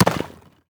house hit; crocs; arboreans

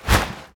gnoll out of bush

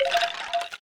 tower necromancer skeleton Summon

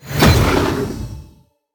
heroes call Of Triumph cast; lumenir

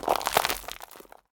Rock Elemental Rally

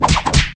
daring strike hit; xin

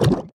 mud summon; wukong princess

